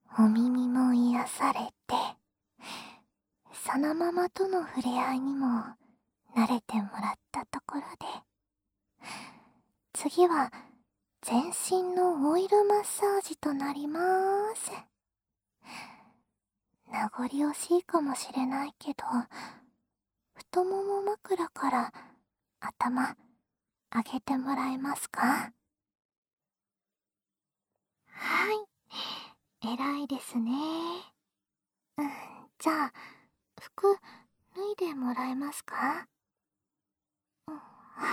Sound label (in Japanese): オイル マッサージ; 音声のみ; 精力の増強オイルマッサージ